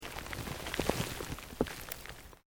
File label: EARTH LOOSE MOVE LONG, Debris